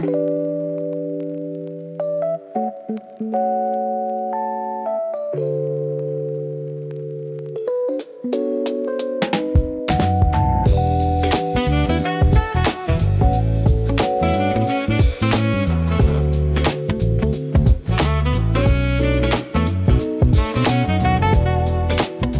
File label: cozy lo fi vibes soundtrack; rainy desk